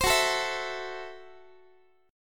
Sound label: F sharp Minor 7th Flat 5th, Guitar; F#m7b5 strummed; x,x,15,16,13,15; 4A,4E,5A,5D,5G#,6C#; chord shape